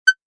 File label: Multimedia, Beep, Button, Menu, Rollover, Web Page Or Flash Animation Sound